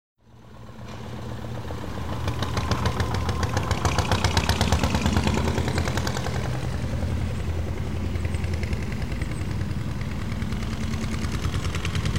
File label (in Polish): Efekty dzwiekowe wies